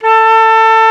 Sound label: FLT FL A4, Flutes; Roland LCDP04; 90 s Sample CDs; C Flutes 3-8; C Flt Sh 6&7